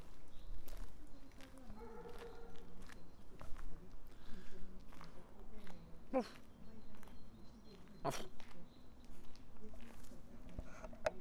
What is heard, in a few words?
woof